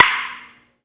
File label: PING PONG, SPORTS; 1000fx